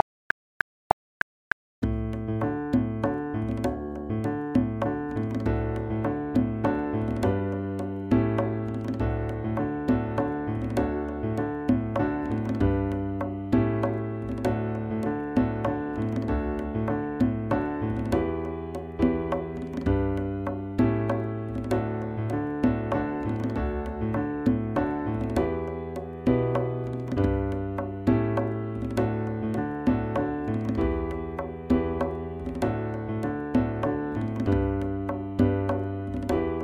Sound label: 198 BPM Backing Track